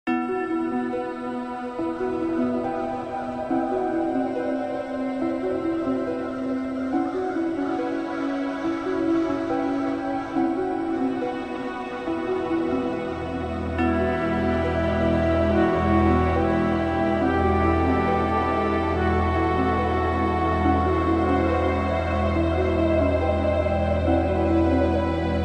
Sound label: Haunted Forest Music; The Twisted Woods